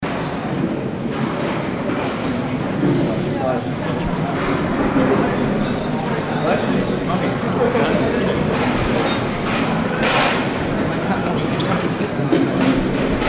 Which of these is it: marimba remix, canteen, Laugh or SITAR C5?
canteen